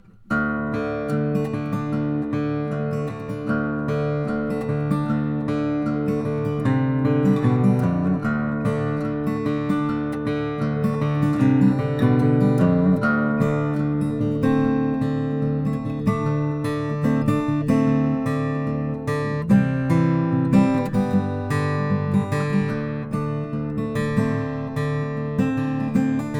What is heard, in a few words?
froggy bottom r14 limited 5a brazilian rosewood